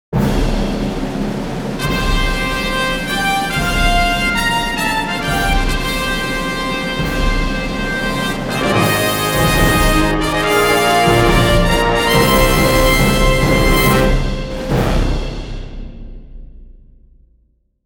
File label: Fanfare; 로고